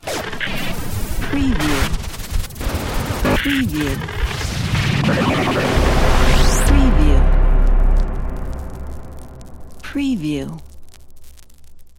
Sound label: glitch logo fx